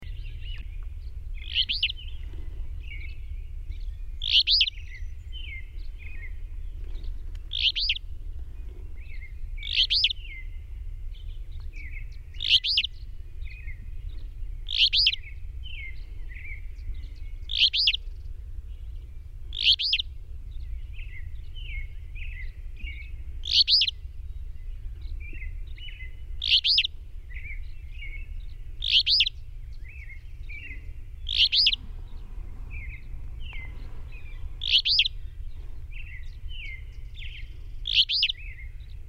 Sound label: Alder Flycatcher